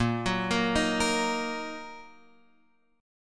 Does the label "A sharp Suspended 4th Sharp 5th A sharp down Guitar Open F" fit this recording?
Yes